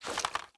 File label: lean in; m16a2